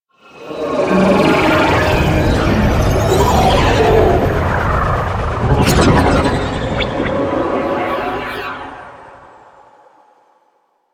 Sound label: Insect Liset Drop Off, Vehicles Flight